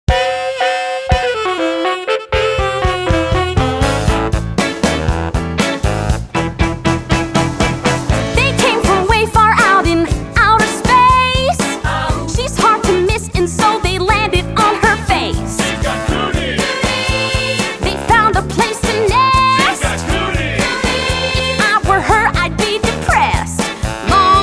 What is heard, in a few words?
cooties vocals